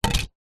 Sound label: household cd disc set down hard surface